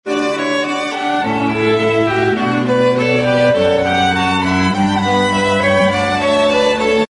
canon

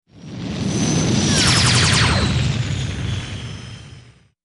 Star Destroyer Fly By Alert

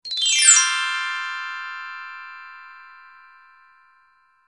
Gliss Down Effect

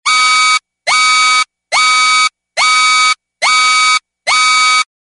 alarm electronic weep

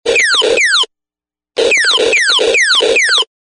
comedy dweep dweep gun

